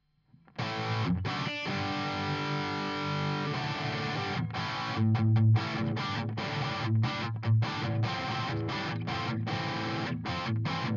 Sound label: EHX 5150 Clean Overdrive PRS SM57